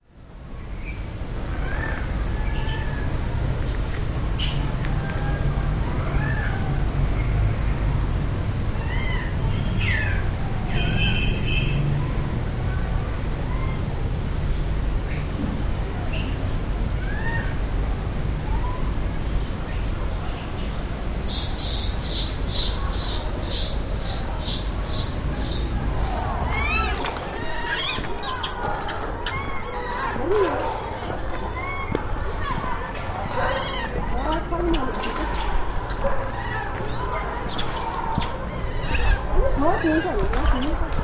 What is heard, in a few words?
Monkey Sounds; monkeying